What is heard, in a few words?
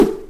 woosh sound effect